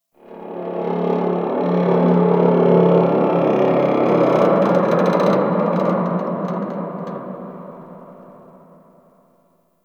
Infested Distant Metal Creak; Warframe; Corpus Infested; Ambience

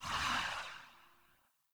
Choir Generic Footstep; Warframe; Foley

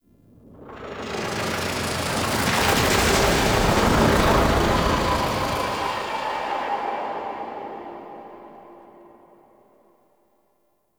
Pulsating Whoosh; Warframe; Ambience; Entrati

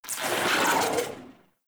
Shock Rifle 2D Tail, Weapons; Warframe; Corpus

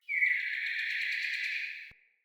Cardinal Female Bird Song

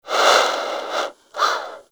Bird Snore; Hunting Critters; Sawgaw

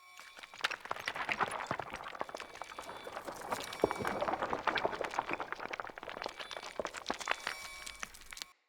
Fey Turn To Stone Loop; Powers

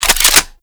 Polearm Holster, Weapons

